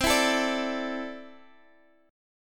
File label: C 6th Add 9th, C, down, Guitar, Standard; strummed; capo 3